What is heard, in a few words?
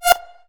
Synth Shot; Vengeance Samples